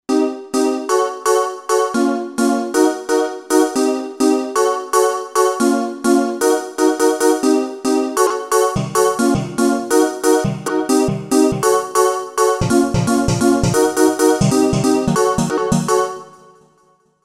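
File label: Lead Minor Vocals, synth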